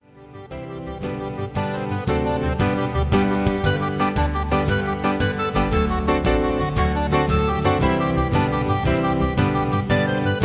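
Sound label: Traditional Irish Songs, Karaoke Track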